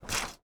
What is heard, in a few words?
Skill1 End Foley; Rebel